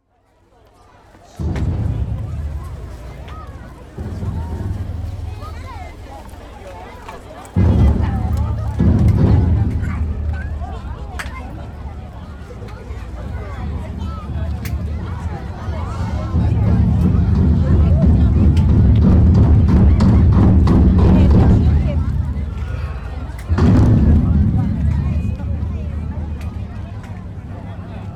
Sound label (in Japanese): maturi no nigiwai to taiko no ensou; Chino Cultural Complex